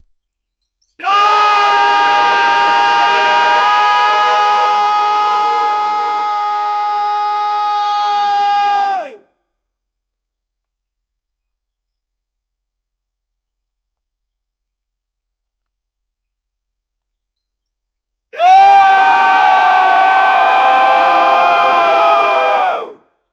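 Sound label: crowd shouting viva, party; crown shouting viva in ca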